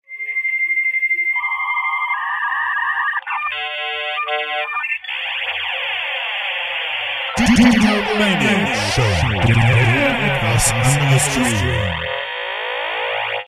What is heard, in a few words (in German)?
Jingle 56k Modem